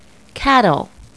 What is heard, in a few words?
cattle